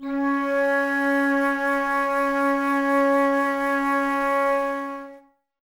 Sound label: Flute, Woodwind; Miroslav Vitous - Woodwind Brass Ensemble; 90's Sample CDs